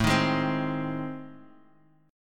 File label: A Minor Major 7th Flat 5th, Guitar Half Step Down